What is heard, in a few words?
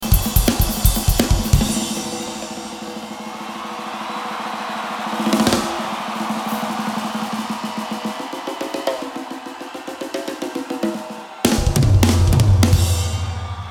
Drum Solo